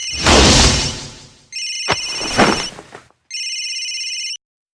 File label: breakdown